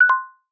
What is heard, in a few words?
Error, AirPods Pro 2 Case Sounds